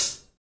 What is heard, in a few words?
HH HH, HH Real